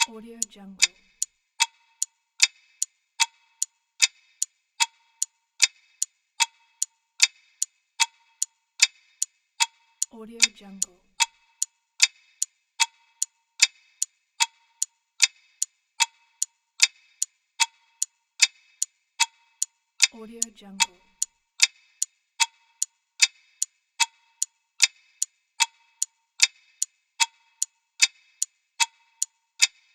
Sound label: the timer clock ticking